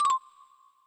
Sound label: multiplayer lose health sfx; Nintendo 64; Banjo-Tooie